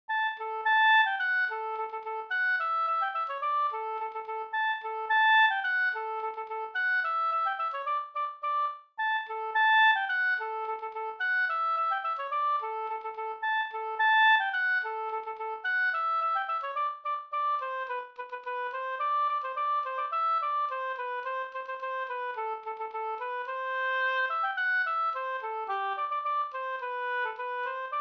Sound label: polka; e Blinda Kalle Stigtomta Sd nr1